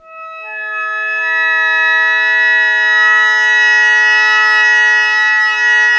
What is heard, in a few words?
prc A1, Sho Percs Pad Shaku, CASIO FZ-1; Retro Sampler